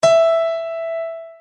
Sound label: E5 piano; notes